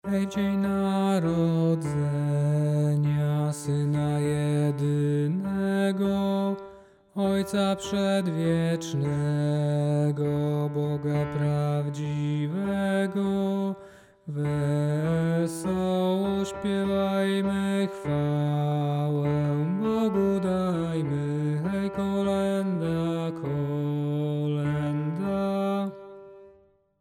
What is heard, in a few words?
bas; Hej w dzien Narodzenia